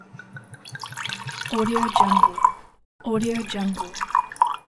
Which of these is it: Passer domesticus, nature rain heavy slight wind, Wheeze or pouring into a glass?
pouring into a glass